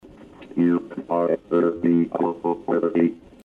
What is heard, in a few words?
laughing; urky